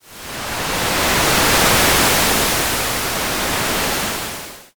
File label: wave